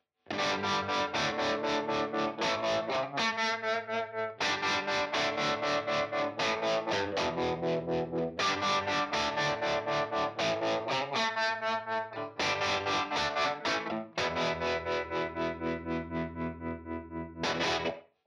Ruby 63 Vib Silver Room Boost Vib

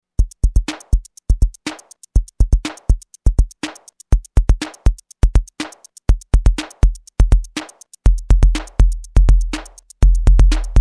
Volca Kick

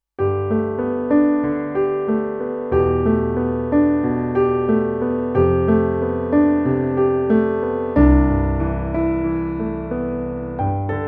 Roland RP102 Concert Piano

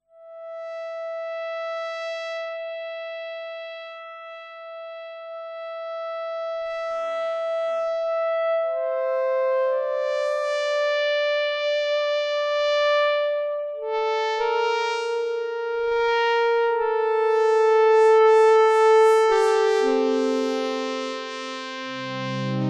sine spray Pad; Expressive E Osmose

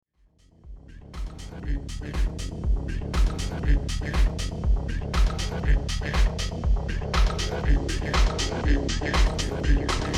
Q Delay; Xone PX5; Masif

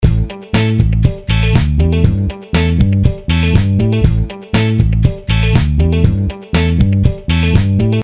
DBX160SL Overeasy Autom Peak

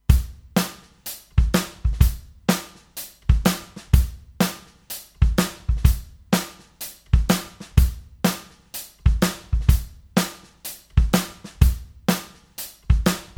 Gyraf G23 S Drums EQ Solid State

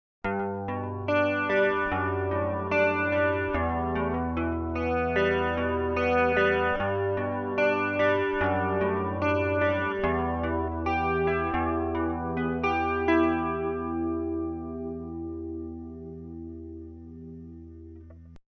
Chorus

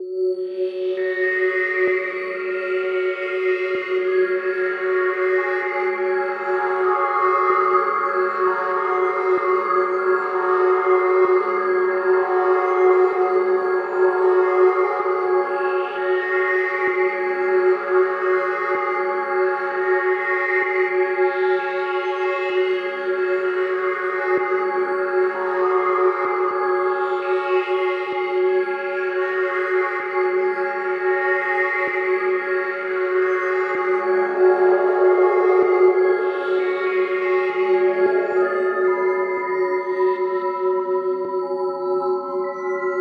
Bouncy Notes Drone; Ableton Live 11

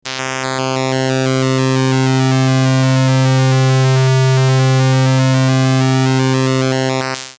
sqrwmod

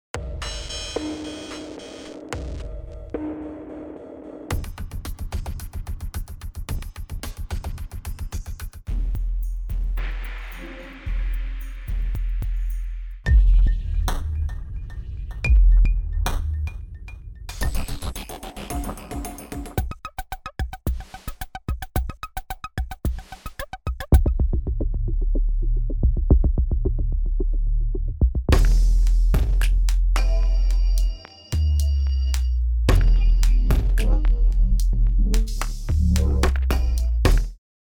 Loop Hybrid Scoring

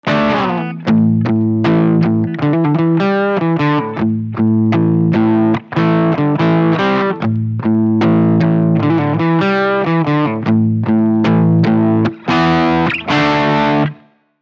Blues

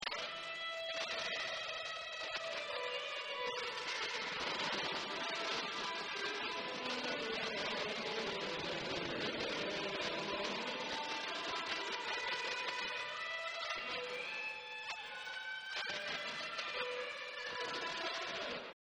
Lick Hotrail

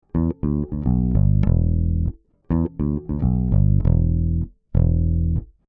DI Bass; Heritage DTT-73; 35dB Gain

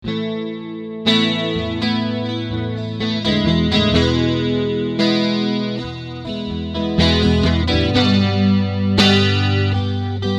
GTR FX HOT; UAD Apollo

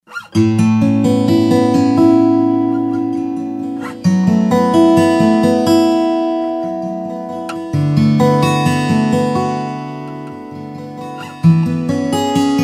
chinese style

